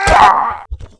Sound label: death